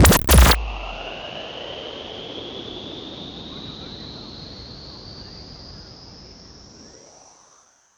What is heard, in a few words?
Artificial Breather; Plastic Surgery